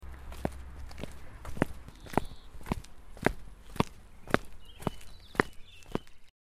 gehen auf pflastersteinen

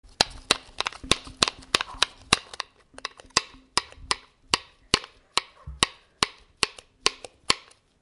walnuss klapper

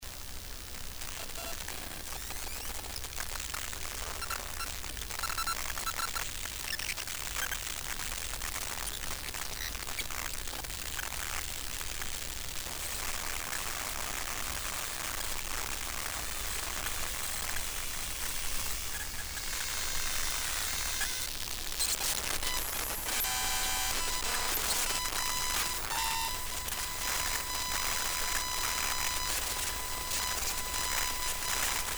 pc faehrt hoch knistern rauschen

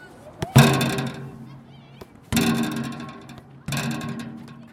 fussball an bande

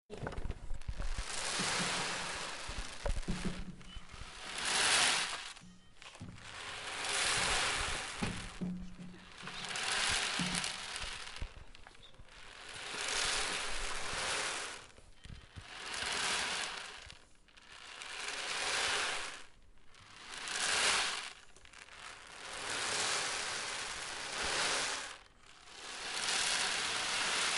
wellen strand

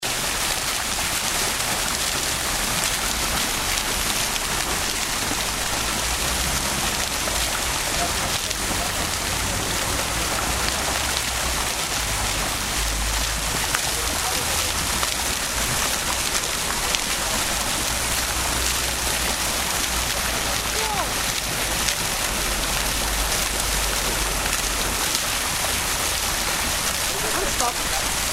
brunnen in der stadt

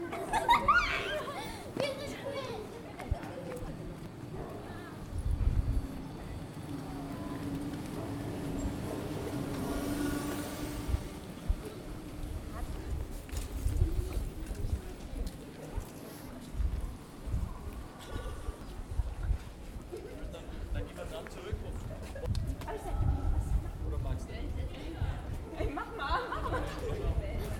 Stadtatmosphäre

